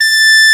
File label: HORN A5, SYNTHESIZER LEAD